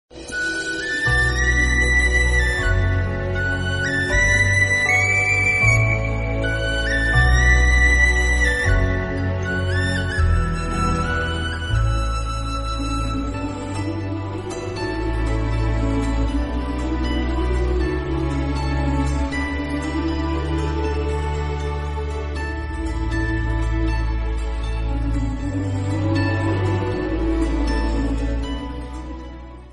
love flute ringtone; anegan movie

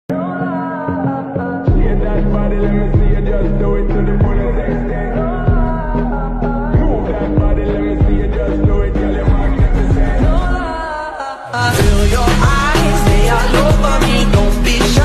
4d music

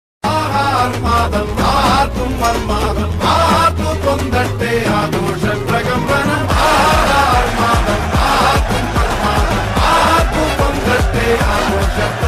song ringtone, Malayalam bgm; aavesham

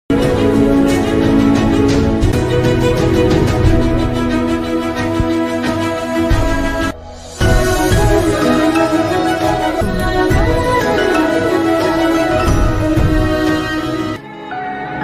movie bgm

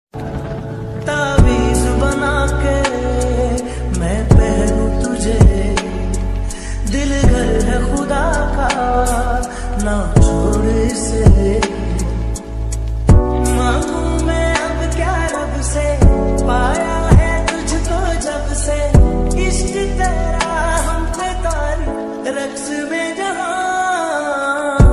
ringtone mobile phone ringtone love ringtone

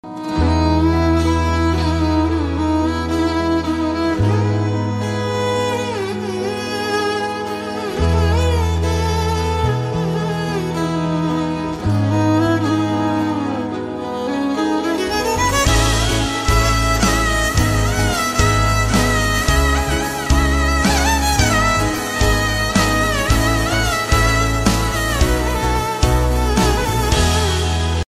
violin ringtone

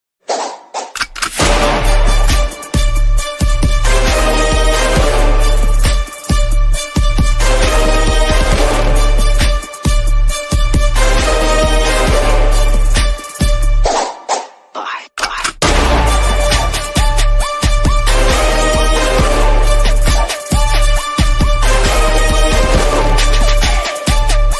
bgm remix; padayappa

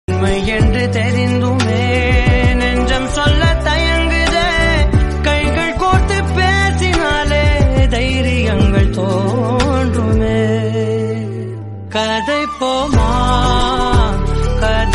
tamil song ringtone

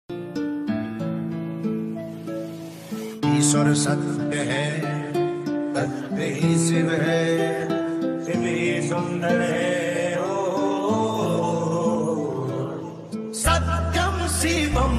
Music Song Ringtone, Mobile Phone Ringtone

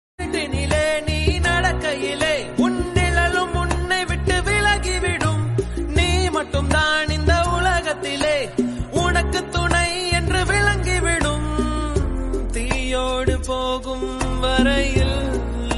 song ringtone; pudhupettai movie

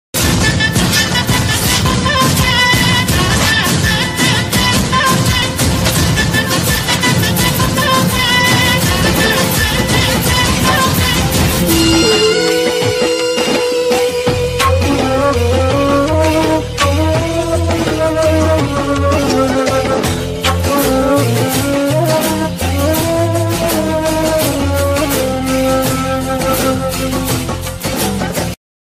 mass bgm; pudhupettai

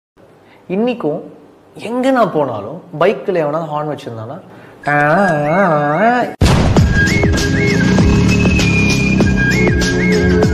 bike bgm; polladhavan; dhanush

